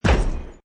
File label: Hi Tech Panel Servo Button; High Tech Sound Effects